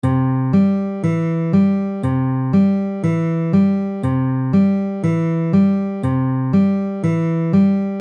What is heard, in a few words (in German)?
fingerpicking beginners, westerngitarre; fingerpicking westerngitarre lernen guide